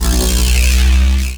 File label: Rail Jack Weapon Four Mech Clip; Warframe; RailJack Weapons; Modular Weapons